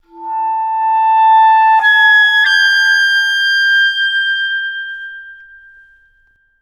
easy germs, music mob; Left 4 Dead 2

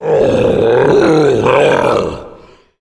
tank growl; voice, idle

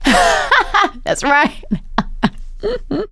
laughter; producer

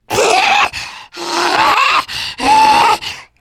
spitter lurk; voice, idle

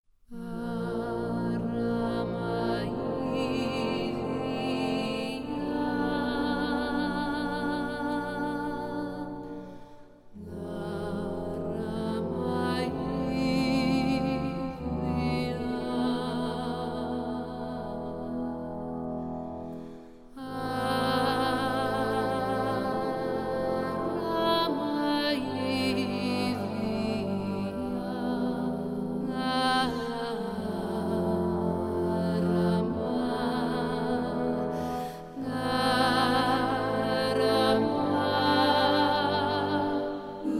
Chorale

